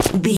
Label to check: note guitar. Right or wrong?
Wrong